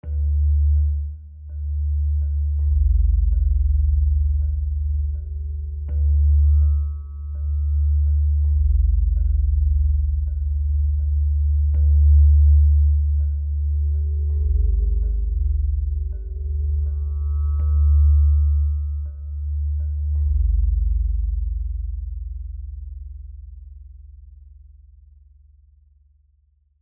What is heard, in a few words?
Combat Tension Ambient